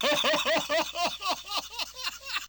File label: laugh, Vocal Samples; laugh2